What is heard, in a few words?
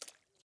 water step; teardown ps5 windows xbox series xs gamerip 2022